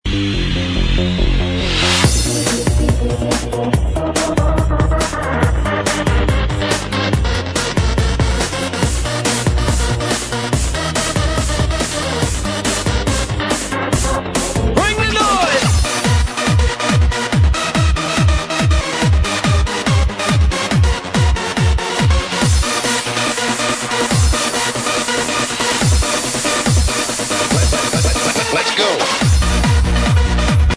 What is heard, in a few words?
club mix